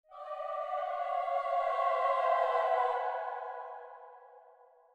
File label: zombie choir